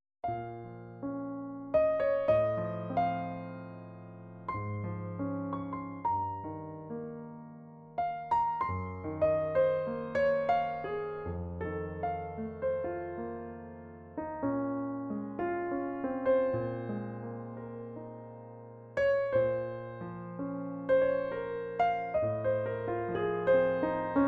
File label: Romantic, improvisationer